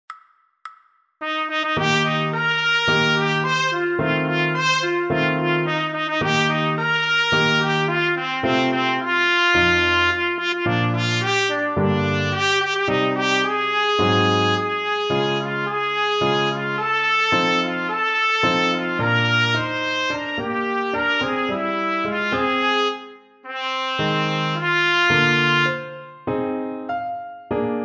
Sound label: trumpet